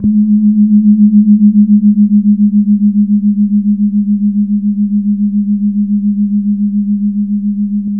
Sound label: jegogan, gamelan; gravityharps